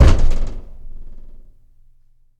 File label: Boxing Ring Bodyfall